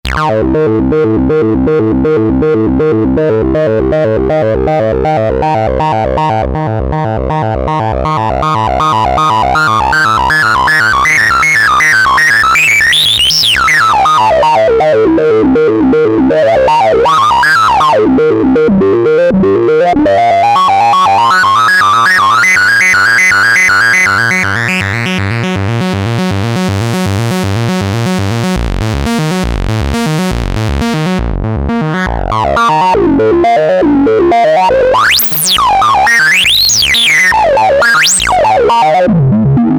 Acidic Bass

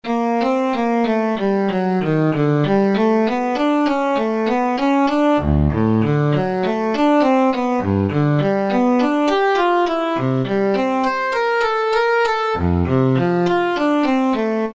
Digital Cello; Factory preset demos

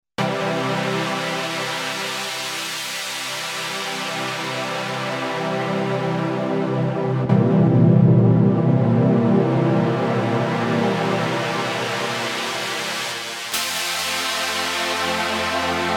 Big Sweep Stab

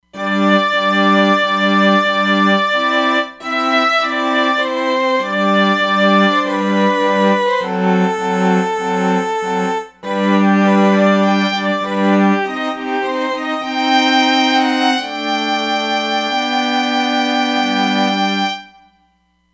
Dolce Qrt; Roland Fantom XR

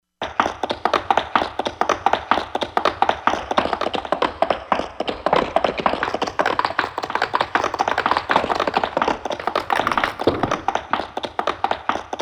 Horse C6; Korg MSC-16S Environment

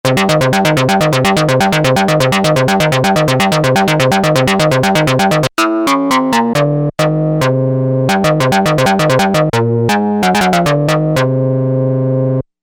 Arp Lead; Arpme

